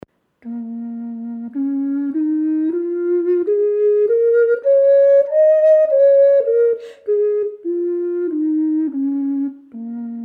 Indianisch Bass B; Indianische Ocarina

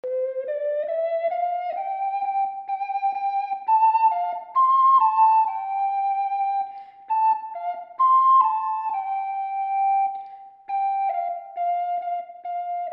fuchs, ocarina

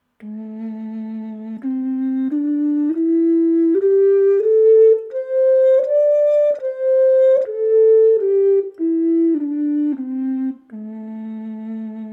Indianisch Bass A, ocarina; Indianische Ocarina

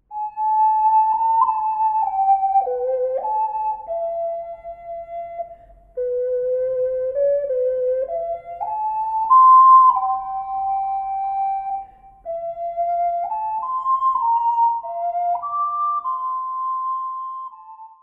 Luegid vo Berg und Tal, ocarina

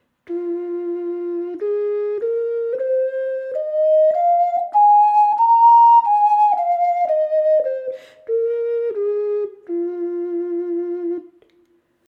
Indianisch F, ocarina; Indianische Ocarina

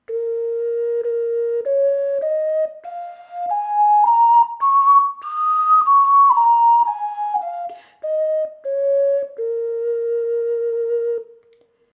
Indianisch B, ocarina; Indianische Ocarina

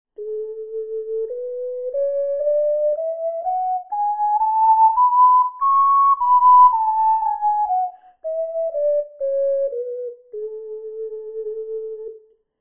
A Tonleiter, ocarina

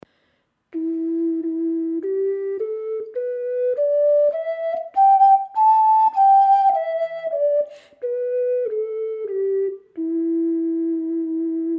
Indianisch E, ocarina

